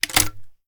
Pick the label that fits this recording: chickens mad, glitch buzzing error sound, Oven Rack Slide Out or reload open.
reload open